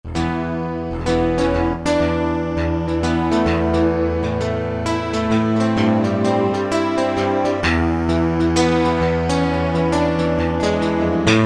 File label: Rock Guitar, Roland PN-D50-04